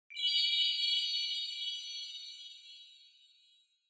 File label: Onoma Sparkle High Short; オノマトペ キラキラ; 高 短